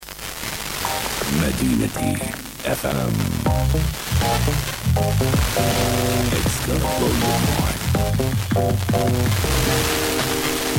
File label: 99 5 MHz; Medina